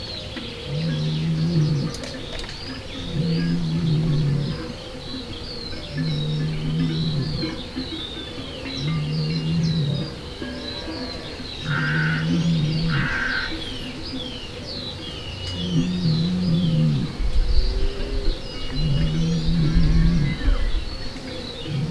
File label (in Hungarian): magasból és távolról, őstulok; Hortobágyi Nemzeti Park